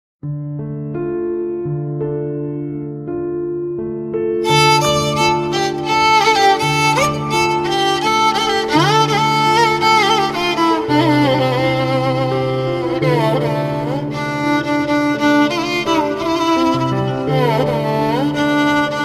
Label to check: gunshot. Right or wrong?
Wrong